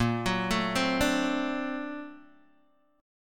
A sharp Augmented Major 9th A sharp, Guitar DADGAD; x,1,4,2,3,0